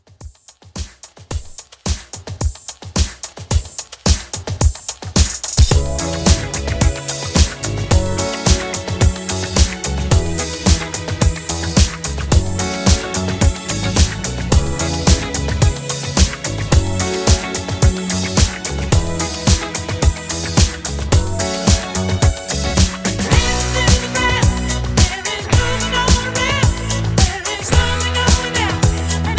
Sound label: 70s Disco Re Drum